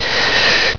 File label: die; gman